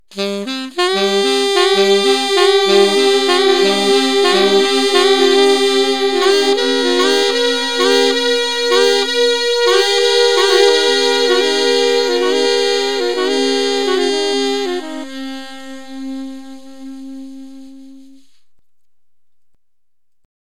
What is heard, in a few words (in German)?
sax